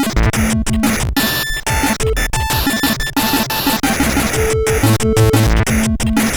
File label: Ambieent, CSG8580R5, sid